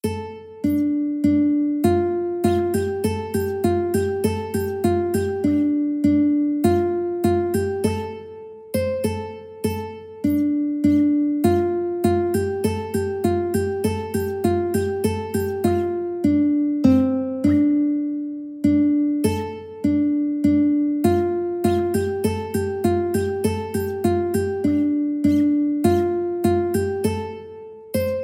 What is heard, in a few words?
Chilly Wind UKE